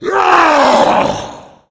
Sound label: tank throw, yell; voice; Left 4 Dead 2